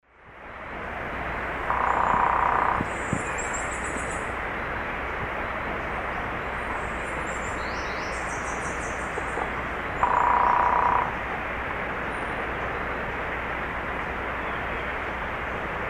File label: Veniliornis affinis, drum; S. America; Eastern Lowlands